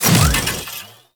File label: Mech Melee Swing Start; Nechro Mech; Vehicles